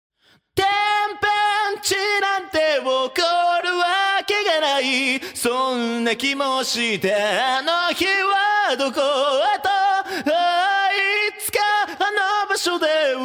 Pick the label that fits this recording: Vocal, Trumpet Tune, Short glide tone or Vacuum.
Vocal